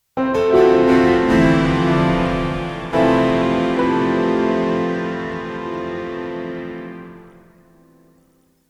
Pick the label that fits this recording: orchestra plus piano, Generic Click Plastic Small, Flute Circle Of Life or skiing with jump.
orchestra plus piano